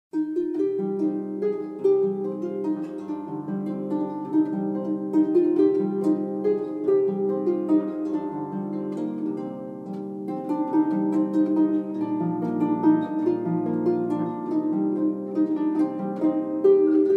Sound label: celtic, welsh carol and variation